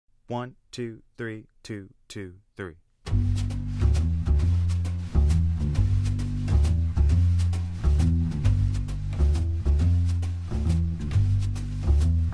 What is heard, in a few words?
jazz